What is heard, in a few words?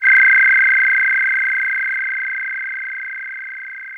DX100 C6